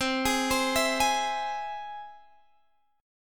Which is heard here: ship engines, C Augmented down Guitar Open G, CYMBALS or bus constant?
C Augmented down Guitar Open G